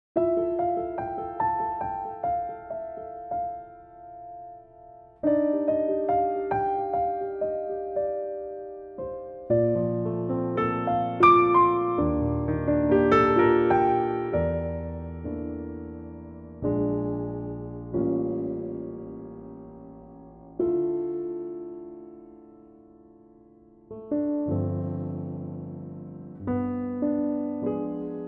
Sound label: Piano; key - F - vocal range - Eb to A; Here's a really intimate piano arrangement